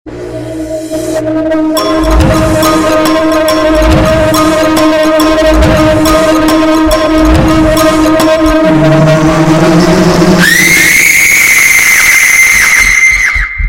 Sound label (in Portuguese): tom de terror sms